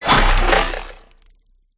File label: Ice Spell Big Freeze; sound spark